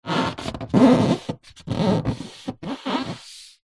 sport surfboard epoxy resin handle squeak creak; zapsplat

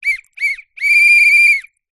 whistle plastic toy; foley; zapsplat

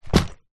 foley luggage case small childs drop on carpet

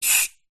industrial gas release hiss short from gas bottle

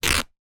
foley shorts thin material rip tear

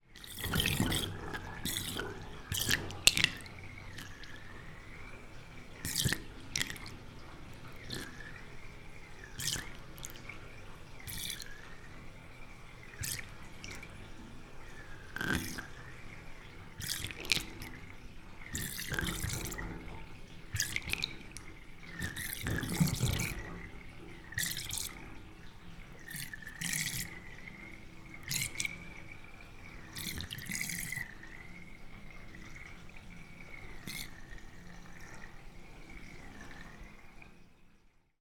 household bath drain water whirlpool plug; zapsplat

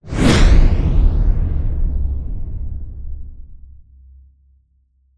Swell To Impact Flanged; bottlerocket

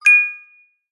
multimedia notification bell chime ping tone

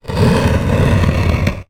rock slide on wood; foley; zapsplat

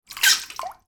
toy duck squeeze out water

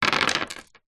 jewelry necklace pebble set down on wood table; household

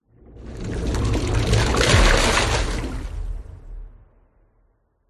water whoosh impacts movements; george gazetis

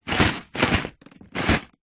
leisure box movement table; lego

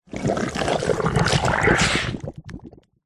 cartoon slime gooey mess movements; zapsplat

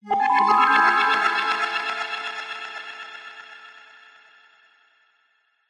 fantasy magic mystery glissando stutter sinister

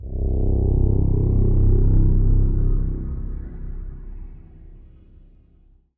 Transition Whoosh Flutter; bottlerocket

